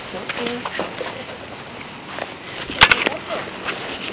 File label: haha; evps; 5 floor